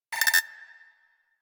Rifle Firing Stopped Mech; Weapons; Warframe; Corpus; Crp Ruban Rifle

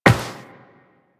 Step Metal, Footsteps Walk; Warframe; Tenno

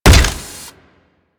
Step; Warframe; Corpus; Arachnoid Wraith

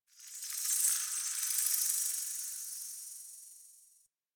Insect Day; Ambience; One Offs; Warframe; Eidolon Plains; Eidolon Landscape

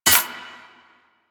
Scrap Spawn; Warframe; Missions; Zariman; Zariman Assassinate

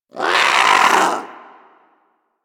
Vocal Alerted, Hunting Critters; Warframe; Vulpaphyla

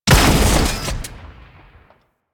Flak Cannon Fire; Warframe; UT Flak Cannon

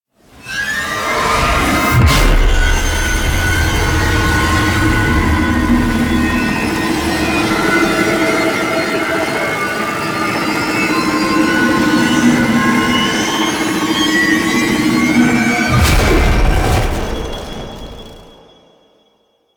Beam Fire; Drifter Lotus Operator Awakes

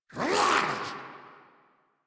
Attack Effort; Grineer Ghoul Expired

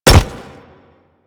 Pet Step Default; Warframe; Alad V Pet

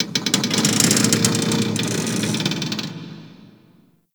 Ocean Close Metal Creaks; Warframe; Grineer Ocean

